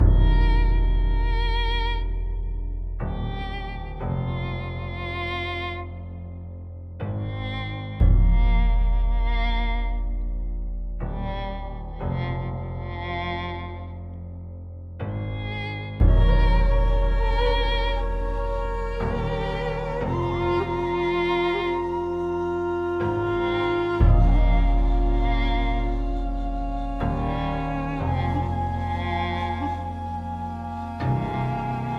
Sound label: Home Finally Calm Loop 60bpm 8bar; project zomboid